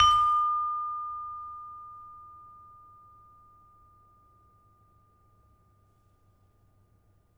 Peking hard, gamelan; gravityharps; timbrebox

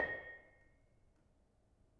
Bonang Panerus, gamelan

